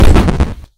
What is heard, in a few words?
slam